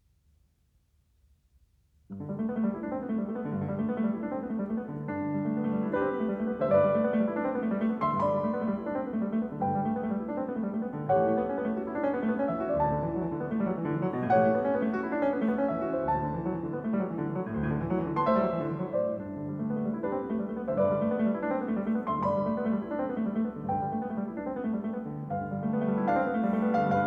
Preludio in sol maggiore, piano; Chopin - Piano Works - Vladimir Ashkenazy

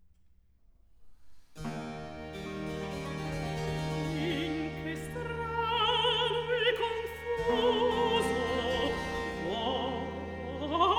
Cantata, Recitativo; Cantata, RV 683 'Amor, hai vinto'- III. Recitativo; Antonio Vivaldi (1678 - 1741); Vivaldi - Concerti e cantate da camera III (2005)

